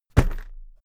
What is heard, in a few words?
briefcase plastic drop hard; industrie wirtschaft; bueroarbeit